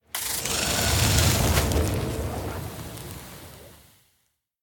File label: Spider Death; Devour; The Inn; devour 2021 gamerip pc macos